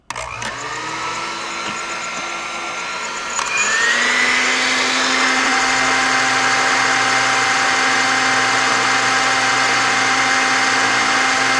mixer

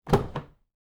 car door open

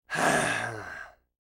sigh

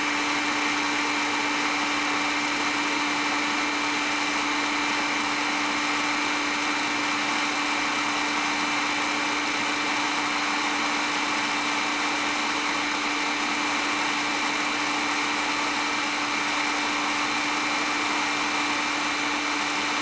machine

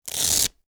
velcro strap

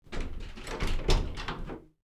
door open

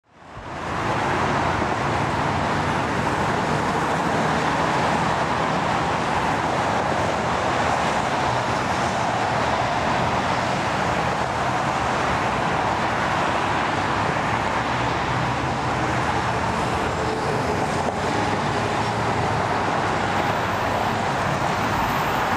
freeway

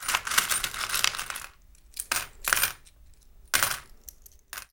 coins

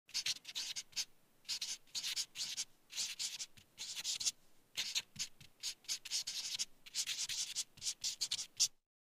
writing

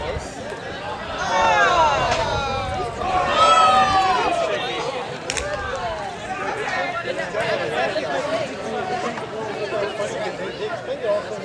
crowd outside, ambience sounds

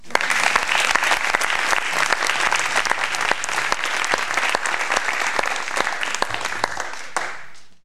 applause

